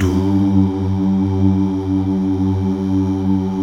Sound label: Mal Duh; Best Service Gigapack 1